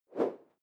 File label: whoosh simple short airy; swooshes-swishes